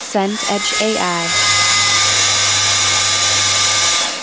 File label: mixer electric